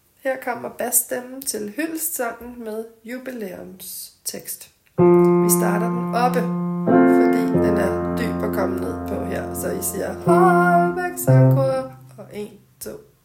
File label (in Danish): bas